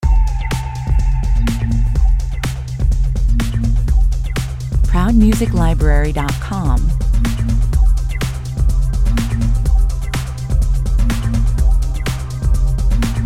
Optimistic dance track, yet this has a sense of being unfulfilled. Constant rhythm, bass and r; Optimistic dance track, yet this has a sense of being unfulfilled. Constant rhythm, bass and repetitive chord sequence.